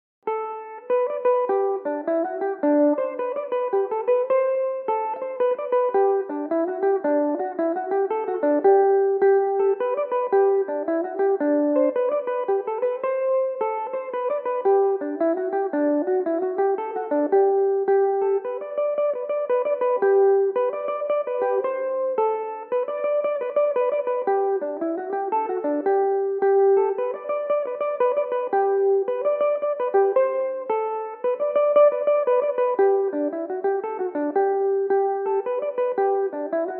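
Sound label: G major belmuse, irish mandolin; Norrie Leary; Jig (6/8); Belmuse EM-190 electric mandolin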